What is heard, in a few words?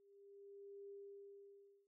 quant noise